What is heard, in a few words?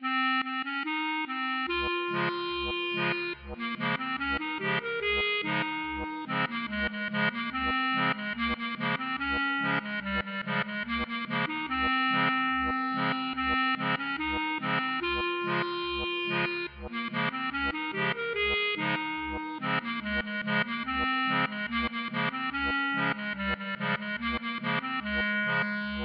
klezmer